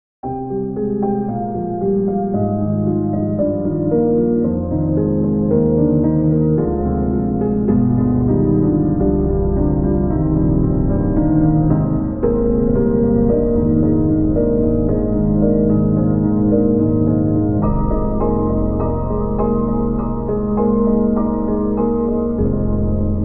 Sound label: Custom C7 Dark Score; Spectrasonics; Keyscape